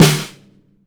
MAPLE SNARE

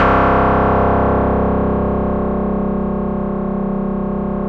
RESO METAL 2, Yamaha VP-1; Music Store

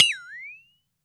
FLEXATONE; AKAI S-Series CD-ROM Sound Library VOL-5

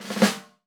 ROLL, 30S JAZZ; Northstar - New Gold VOL-1

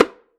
tom slap f, hand drums; SoundBytes - Early Patches

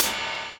DOWN, DOWN KIT 78

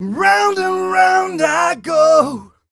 m 128 mel e-flat, Vocals

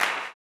CLAP, blues & soul; ILIO; Vocal Planet

